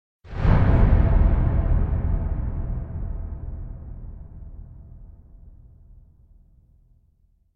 Impact Metal Drop Distant Shock Hit Strong Metal Strike - Deep; Hollywood Edge; sound designer's toolkit v2